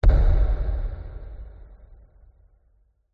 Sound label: hit impact thud low pitch reverb; sound design